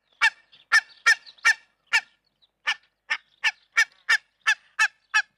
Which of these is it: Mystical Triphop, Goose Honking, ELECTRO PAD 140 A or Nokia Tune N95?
Goose Honking